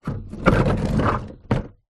household wheelie bin small movement on concrete ground